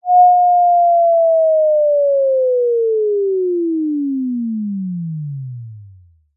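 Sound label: cartoon descend whistle falling tone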